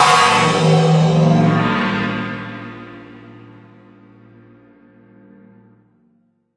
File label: gong morph; Gongs and Super Crashes; single hits